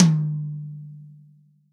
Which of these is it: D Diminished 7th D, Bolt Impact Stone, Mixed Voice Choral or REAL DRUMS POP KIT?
REAL DRUMS POP KIT